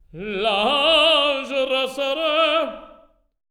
TEN. TRAD; Spectrasonics; Symphony of Voices

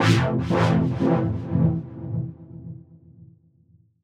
Am Dub Wash, Chords; Electronisounds

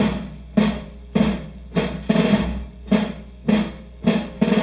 Chamberlin Rhythmate Loop, Drum Machines

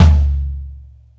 Bo Floor Tom, Tom One-Shots; KJ Sawka - Drum n Bass & Beyond; Drum Tool Box

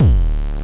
FM Kicks Distortion D1; Drums; LookIMadeAThing Kicks - Vermona Kick Lancet

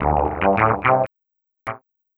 Fonk Line, Funk Keys; Sonic Foundry (Sony Creative Software); Synchro Funk

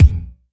E1, synth line; 90 s Sample CDs; NiceBeats; SynthLine VOL-3

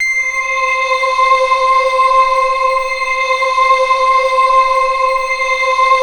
NIGHT C6, NIGHTFALL; Sound Gallery - Charged

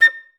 FL S STAC A5; Best Service; Peter Siedlaczek's Advanced Orchestra

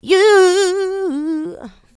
VOCA, Houseworx; Ueberschall; Workz-5

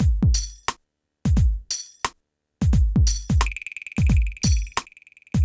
PHATT BEA

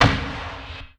Thwock, hits and bits; Zero-G; Total Drum Bass

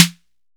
SNARE 808; E-MU Producer Series Vol. 2; More Studio Essentials; Composer

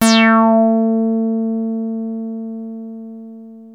303 A3, TB 303

